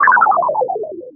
Electro FX; Ueb HE Electro FX

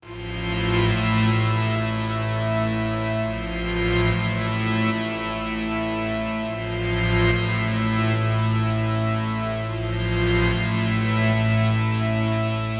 075 bright moody minor synth; SYNTH AND ELECTRONIC LOOPS

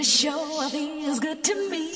diva sure feels good to me, Cheesy Divas; VOCALS and SPOKEN WORD

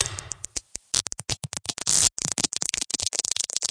130 bpm synth cricket meltdown

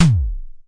Simmons SDS8 tom10; drum machines

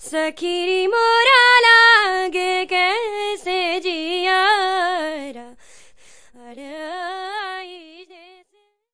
indian fem vocal; VOCALS and SPOKEN WORD

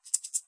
rattle, ethnic and world percussion; Rattles

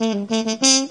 acid jazz trumpet

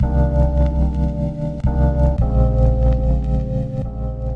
110 mellow crunchy keys; melodic loops; keys organ piano loops

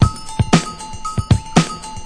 116 bpm, mad agogo, drum loops and breaks

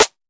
tight hit, Tight n Hard Kit; style kits

